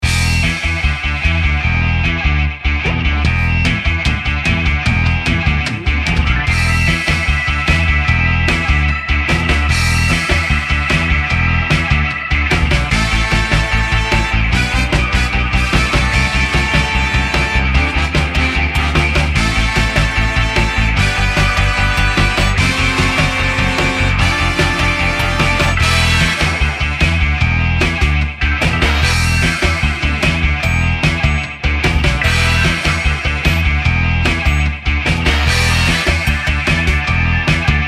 MOTOWN FEEL

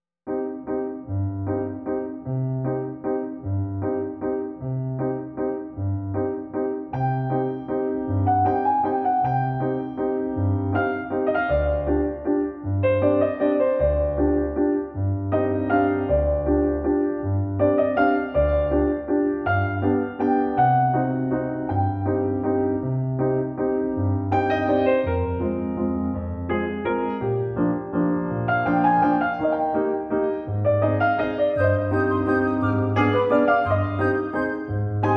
THE PIANO WALTZ OF LIFE

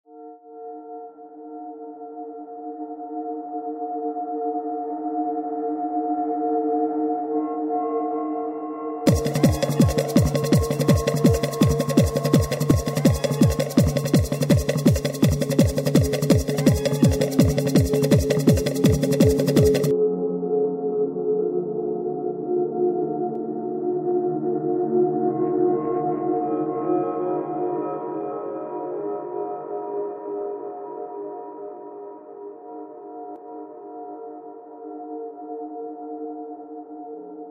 TRIBAL ATMOSPHERE

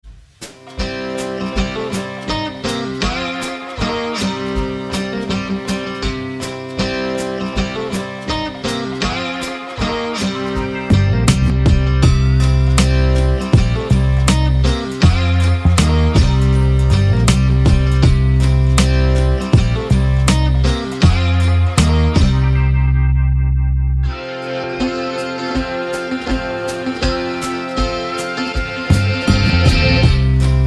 BLUES

